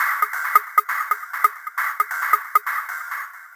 Drums Techno

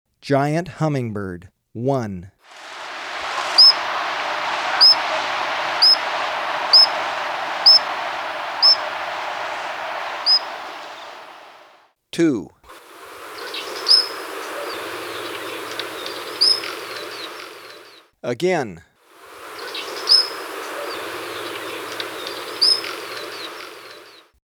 Giant Hummingbird; S. America

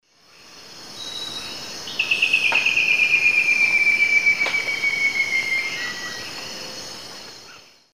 Xiphorhynchus elegans, song; S. America; Eastern Lowlands

